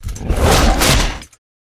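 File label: Rat attack mid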